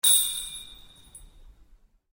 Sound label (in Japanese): 神々しい神楽鈴の音